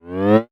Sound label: grow